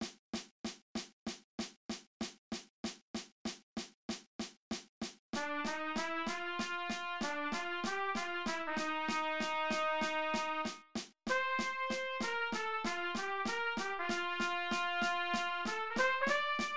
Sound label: Just a Closer Walk cut time trumpet; senior high band